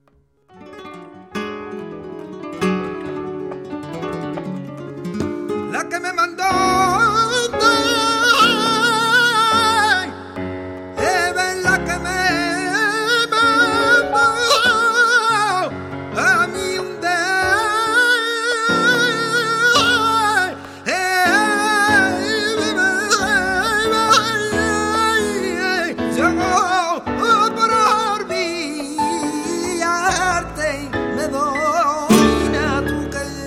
solea, flamenco

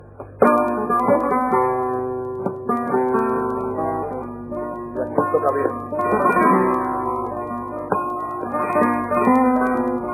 Solea, flamenco; de Noriega

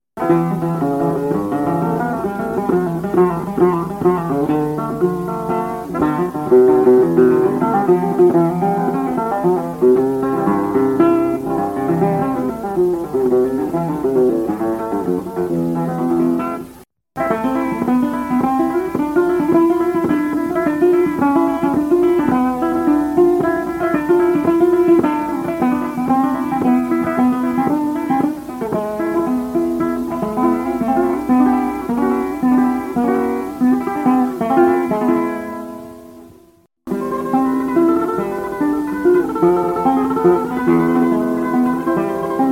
solea falsetas, flamenco

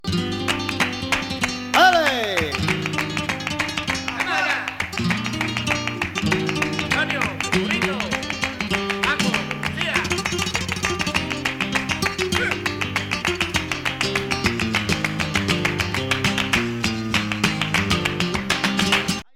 tango, flamenco

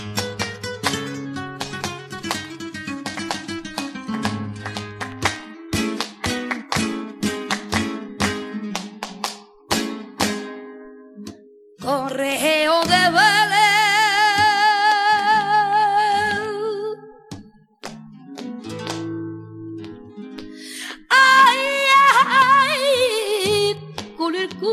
Solea, flamenco; del Fillo

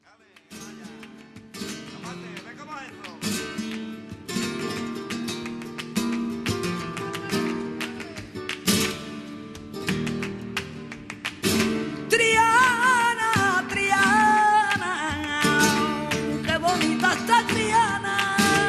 tangos, flamenco

